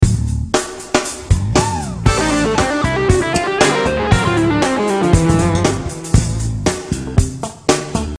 Lick

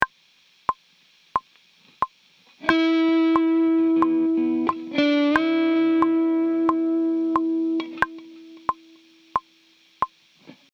Lick

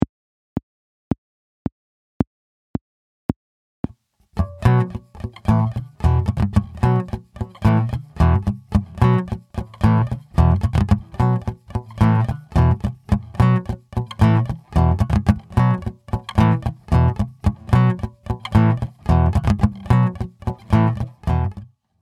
Power Chords

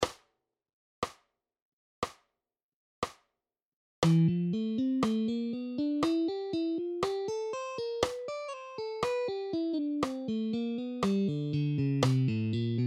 Style BPM 60

